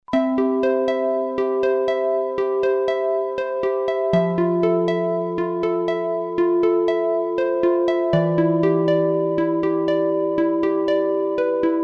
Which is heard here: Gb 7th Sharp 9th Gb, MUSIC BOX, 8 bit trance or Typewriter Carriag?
MUSIC BOX